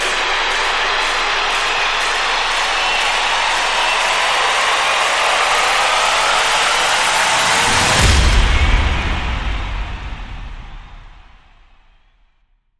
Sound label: Rise Rhy; Rise Rhy Ready Set